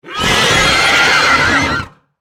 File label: Demon Horse; Monster Sound Effects; Horror; BlastWave